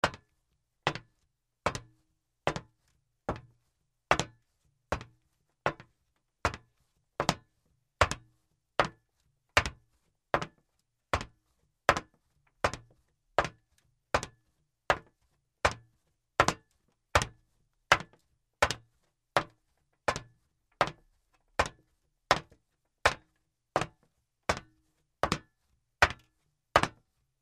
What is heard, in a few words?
Steps on the metal floor in shoes